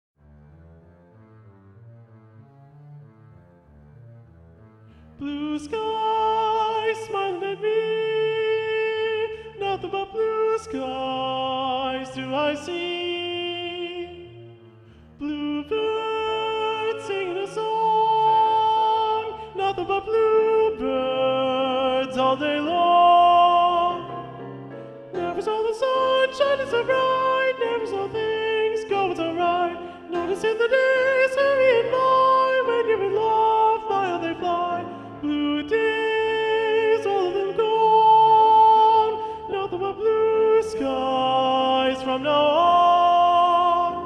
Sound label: soprano 1 predominant